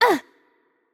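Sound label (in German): Hurt Light, German; de DE; VO; bloom minigame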